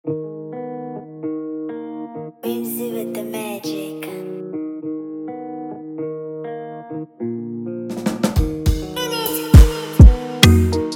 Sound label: Afrobeat Instrumental